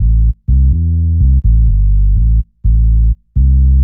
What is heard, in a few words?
DEEPHOUSE BASS 125 A